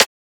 Transient; FLPRO

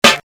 Snare

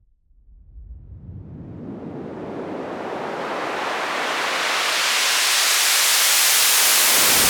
Raise Clean

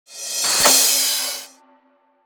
ReCrash; FLPRO

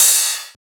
Ride; FLPRO

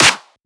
Clap; FLPRO

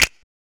Snap

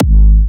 Kicks, Big Room Kicks, G#4

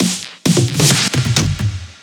EDM Drum Fill; FL PRO

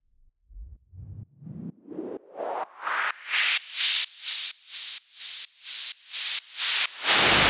Raise SideChained

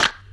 Snare

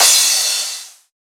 Crash

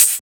Open Hat; FLPRO

